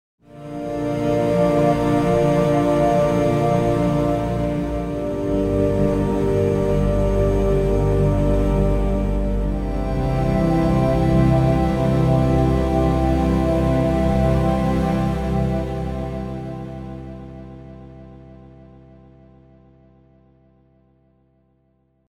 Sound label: Vocalise Pads; Everflow